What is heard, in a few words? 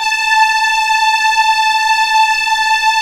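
A5 legato forte, violins; East West; The Ultimate String Collection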